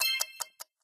timer accelerate

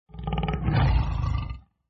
tiger Tap

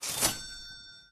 purchase

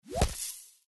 surfer Island Sand Castle Destroyed

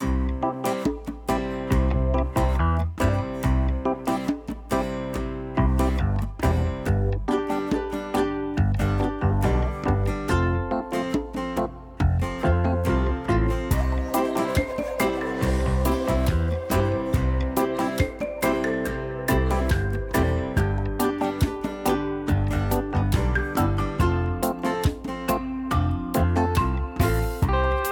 music surfer island

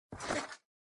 jump Sound

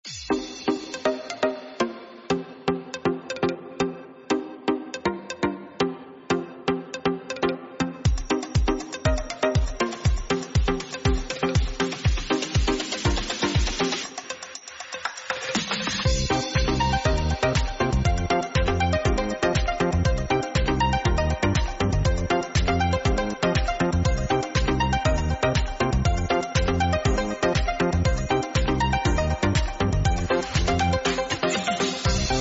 office Party Music

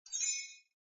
earn Crystal

